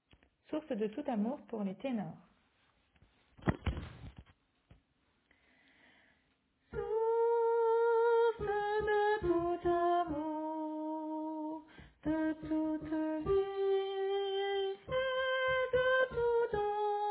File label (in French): Source de tout amour Tenor